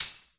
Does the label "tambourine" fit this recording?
Yes